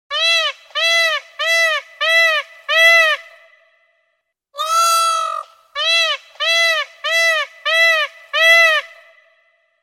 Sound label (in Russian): Pavlin